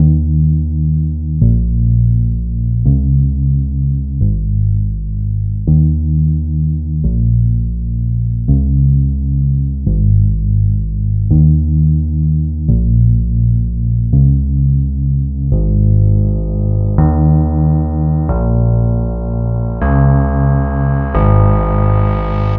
Bell Bass, Drum n Bass; KJ Sawka; Drum n Bass & Beyond

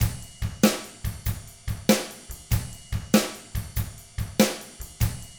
rock 6/8, drums; ILIO; Double Platinum Drums 1

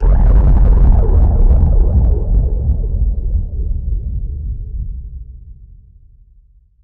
NOISE, Production Effects

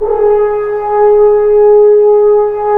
BRS French Horns; Roland - LA Composer vol. 1

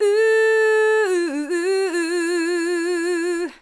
Female vocals and robo-vox

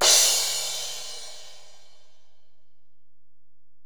Ride, Alesis DM-PRO

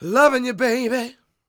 SING, Vocal Planet Blues Soul BLUESY

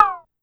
Cowbell CR Down, Pitch Env Various, Clavia DDrum; Clavia DDrum-AT

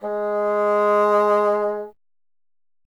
Bassoon G#0; Miroslav Vitous - Solo Instruments VOL-1; 90 s Sample CDs

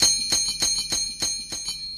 Cymbals, Acoustic Beats; Sonic Foundry (Sony Creative Software); Myths of Technology

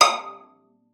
VIOLA FINGER; Xsample VOL-5

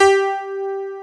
ELEC GRAND, JV 1080; Pro-Rec - Synthsonic

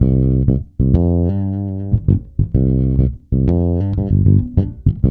C, funk, bass; Spectrasonics; Bass Legends

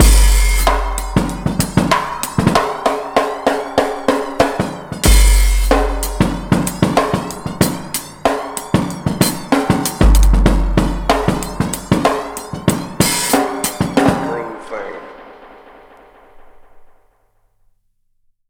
99 bpm, Breaks; ONE HAND; 90 s Sample CDs; Planet of the Breaks

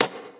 Conga; Equipped Music; Loungin House

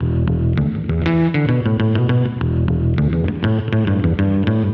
BASS, BASS GTR

